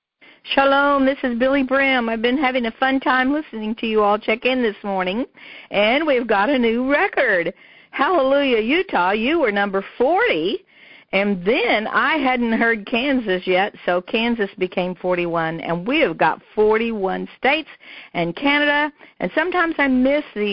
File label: prayer call